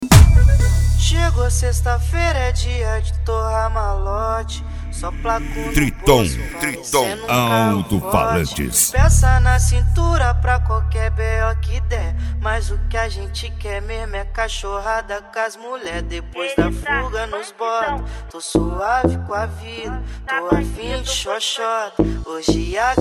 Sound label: mega funk